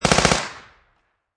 Submachine Gun Heckler And Koch Mp5 Short Burst Close Perspective